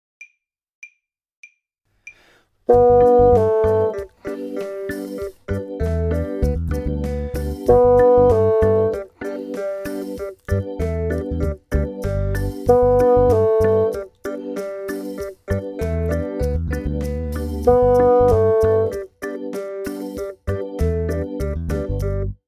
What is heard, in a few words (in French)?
basson